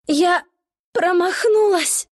death; dota2

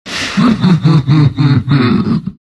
laugh; Medusa

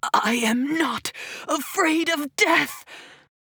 Death; paladins; Huntress

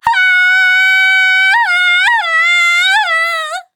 Falling; Maeve; Paladins

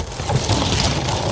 Radiant siege movement

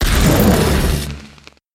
Chain miss; Controlled Burn Timber

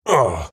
Grunt Hit; Snek; Paladins

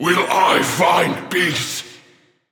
Death; Paladins; Makoa

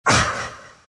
dragon pain; Dota 2; dragon knight

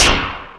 projectile impact; Dota 2; Puck

